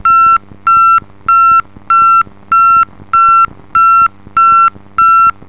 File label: intermittent